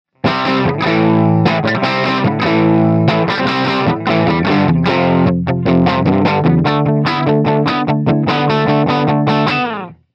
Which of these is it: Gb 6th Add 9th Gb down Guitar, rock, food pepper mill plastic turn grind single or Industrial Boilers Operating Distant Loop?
rock